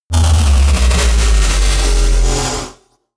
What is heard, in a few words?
dubstep metal wobble bass drop chaotic